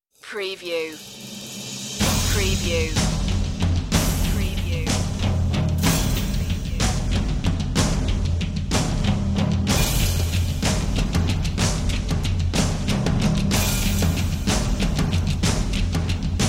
percussion

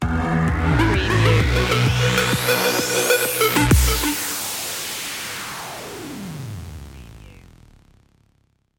echolot stinger

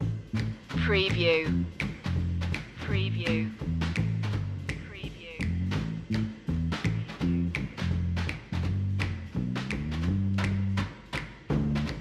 fixin to score loop; screwball comedy

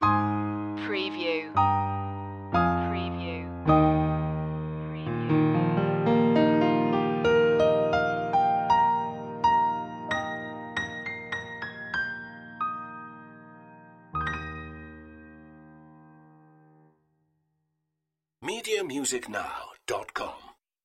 we wish you a merry christmas piano; media music now

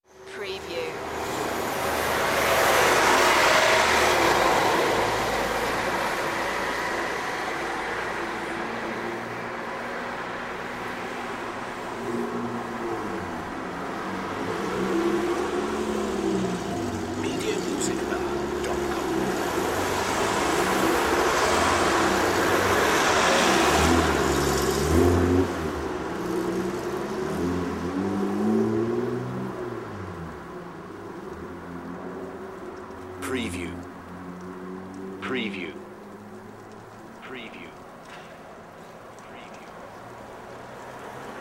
Town road traffic - hill

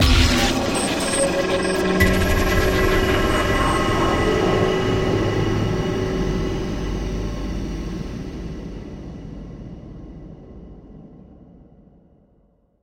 Hit Burst

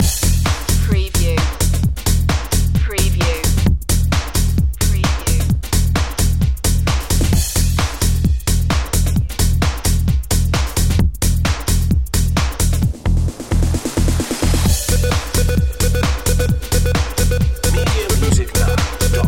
bleepshack

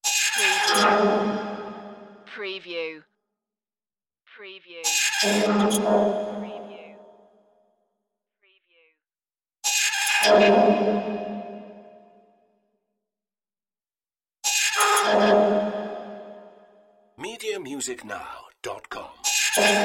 disappearance beam sizzle

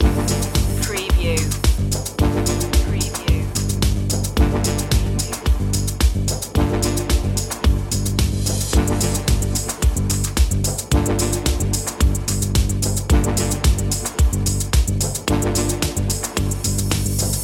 corporate machine loop; media music now

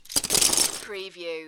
Lego, drop several pieces into lego box

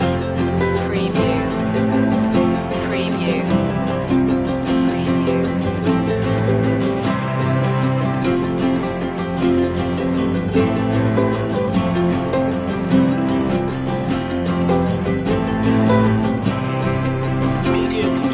the nu-folk tune no vocal

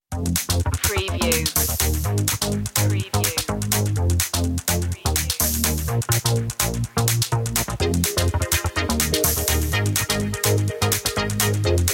wet water loop intro; media music now

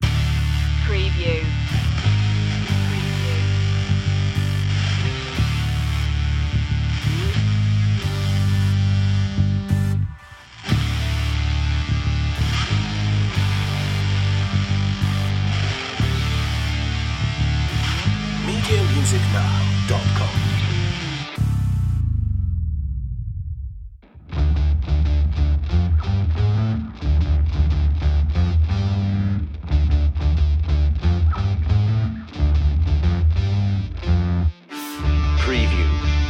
fire power no drums

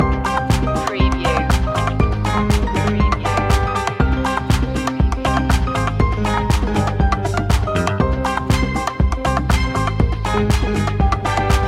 latin dancing loop; media music now

